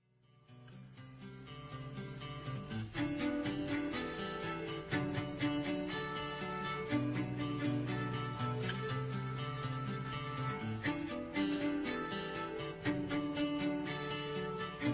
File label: glass vase cello